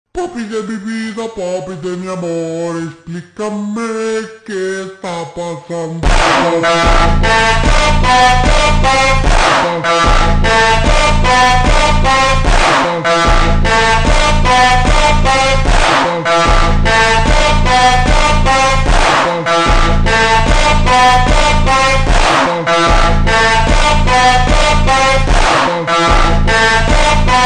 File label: funk ultra slowed reverb